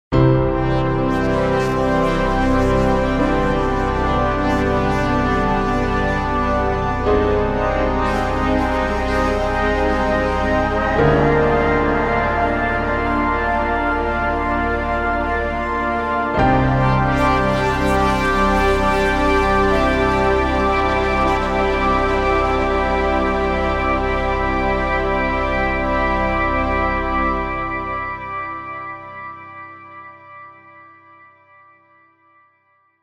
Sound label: Nonlinear Labs C15 Pad; Arturia Jun6 V; NI UnaCorda